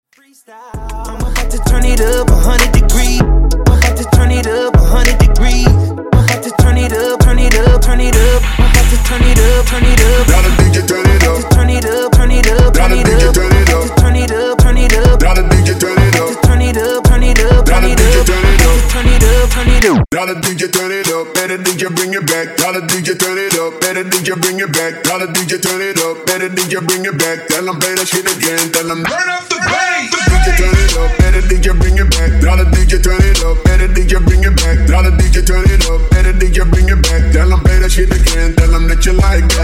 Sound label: Wordplay 90 128 Bpm